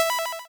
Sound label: disassemble; action RPG game; Adventures in Lestoria; Sig Productions